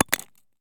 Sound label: grenade collision smoke russian; Escape from Tarkov